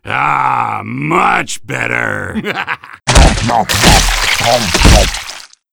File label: win; painis cupcake